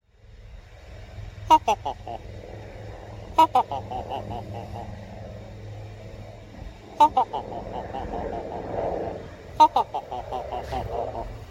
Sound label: raven alone mimic laughing